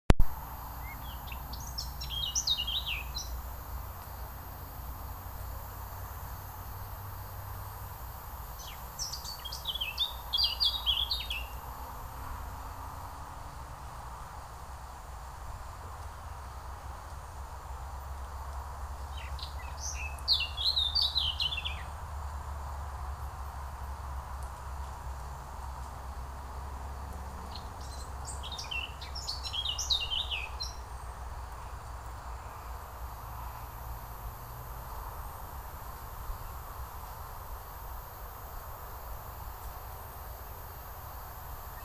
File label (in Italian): capinera